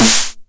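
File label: 909 SD Tape, snare drum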